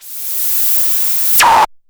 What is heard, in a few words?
MiniBrute, Filtered Noise; noise hiss crackle